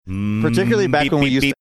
beeping while talking

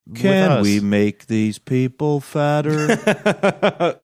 singing can we make these people fatter

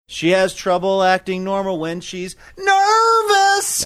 singing

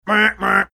making gear buzzing sounds

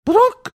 making text notification sound

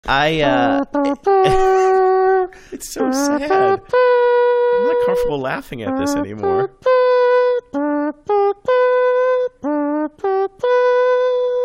taps mouth horn

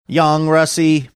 singing young russi

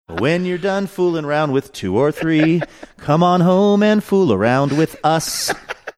singing when you're done fooling around

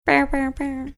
making non excited air horn sound

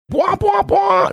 making air horn sounds

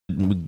funny sound

